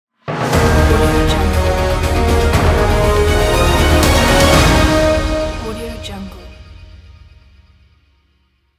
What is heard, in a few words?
for breaking news logo